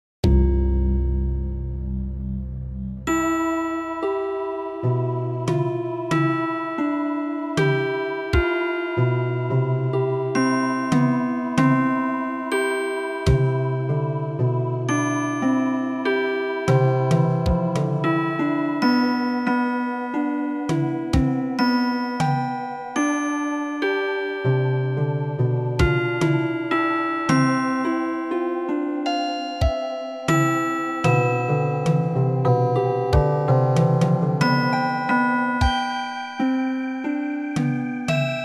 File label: village festivities